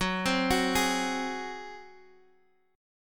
F sharp Suspended 2nd Flat 5th, Guitar, Half Step Down